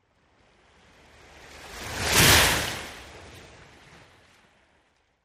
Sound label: Ocean Surf Water La